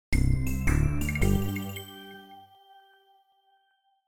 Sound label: Level Complete; Gold Miner Joe; ios macos windows